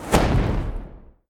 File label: betline End Explosion; netent; wishmasteroct